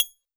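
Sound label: Old Banjo DX100 G sharp 7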